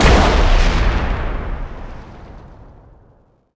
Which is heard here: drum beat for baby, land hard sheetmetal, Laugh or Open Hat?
land hard sheetmetal